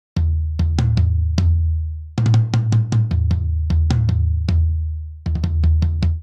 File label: Grunge Rock Toms Only 77 bpm; 4 4; MIDI Clips; Ableton; Factory Packs; Drum Booth